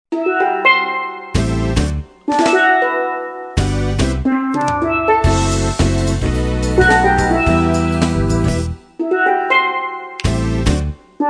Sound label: steel and voices